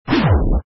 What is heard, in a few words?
death; revolution